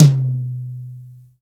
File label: TOM, Drum Dry; East Collexion; Drum 1